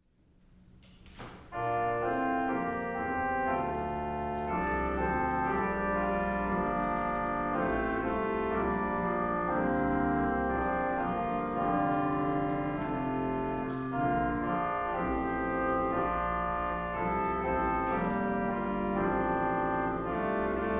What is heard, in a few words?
Hymn In Canon